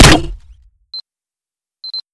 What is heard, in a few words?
grenade jumper shoot; tf2